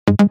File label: synth